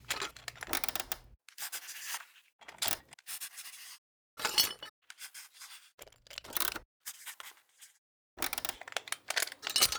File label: research item; Rust